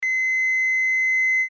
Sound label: fax beep